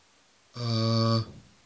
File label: Vowel Sounds Bb